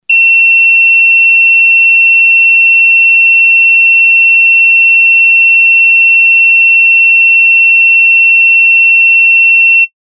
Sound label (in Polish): ton